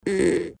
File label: fart